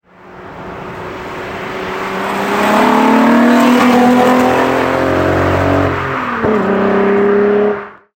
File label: drive by; gti vr6